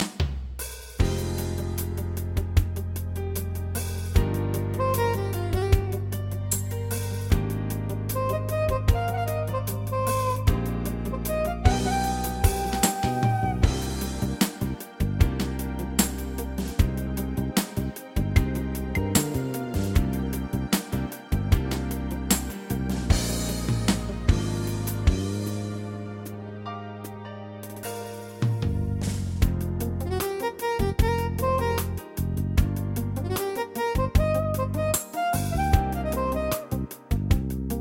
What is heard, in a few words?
16 Beat Ballad